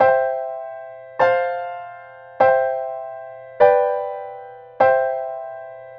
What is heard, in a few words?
piano